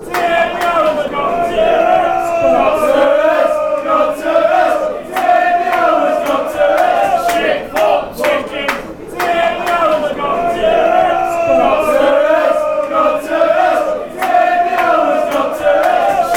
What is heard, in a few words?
bolton chant